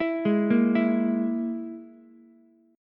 E Major Flat 5th, Ukulele; arpeggiated; JGuitar

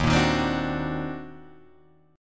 Eb Minor Major 13th, Guitar, DADGAD; EbmM13; strummed

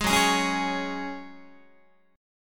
F sharp Suspended 2nd, Guitar; strummed; JGuitar

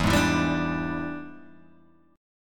E 7th Sharp 9th Guitar Open G, chord

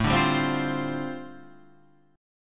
G 7th Suspended 2nd Suspended 4th A

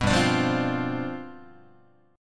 G 11th, Guitar; G11 strummed

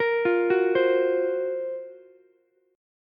F sharp Major 7th Flat 5th, Ukulele; arpeggiated; JGuitar

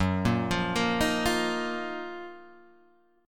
F sharp Major 13th, Guitar, Standard; arpeggiated; JGuitar

